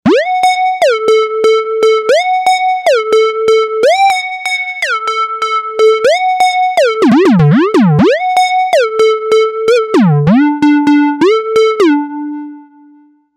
Lead; Novation UltraNova; ChuckieSoundpack

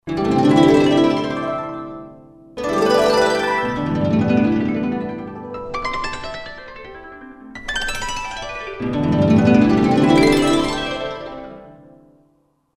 Harp Gliss, Roland SR-JV80 Orchestral

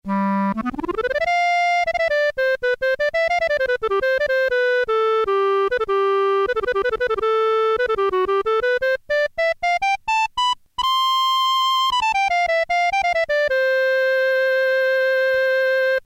clarinet, Technics SX-K450

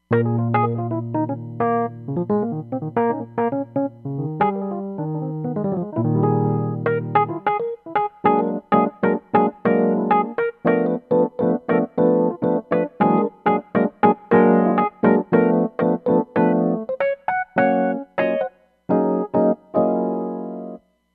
Stage Cabinet

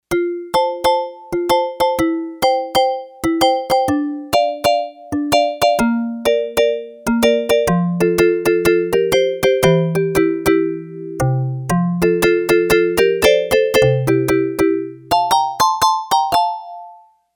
Maribumba; Factory Patches; Voices

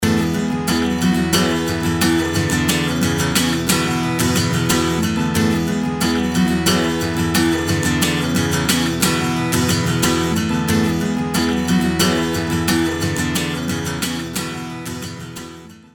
Modern Rock Guitar; Apple GarageBand 2; Apple Loops

